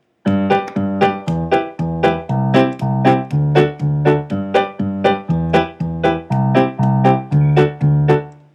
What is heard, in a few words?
simple bass and chords, piano; maries wedding